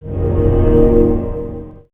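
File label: strings; alone in the dark 4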